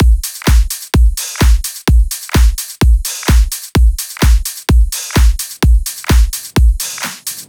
Loops 128BPM, Drumloops

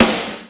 FM SD, MOD. DRUMS; East West; Global Sounds

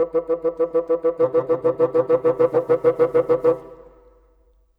Bassoons, Orchestral; Sonic Foundry (Sony Creative Software); Orchestral VOL-2; Modern 22

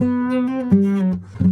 Sarangi, Acoustic String

